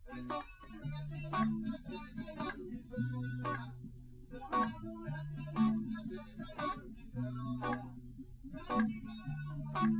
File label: nodrums liverec 250ms 5cm; SiSEC08; SiSEC underdetermined